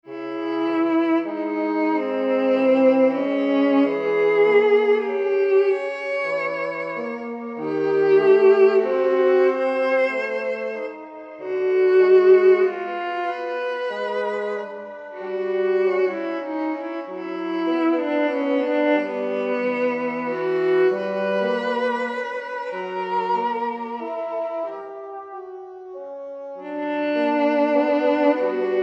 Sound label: Waltz